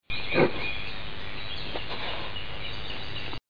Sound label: hiss